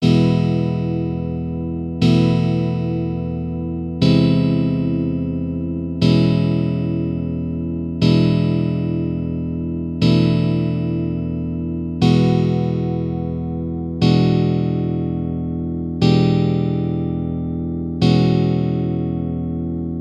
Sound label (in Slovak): odvodenych akordov Edur